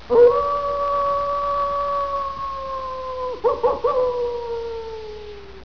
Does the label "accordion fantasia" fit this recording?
No